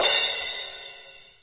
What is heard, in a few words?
Crash, Boss DR-550, Drum Machines